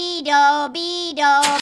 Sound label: Bee-do Bee-do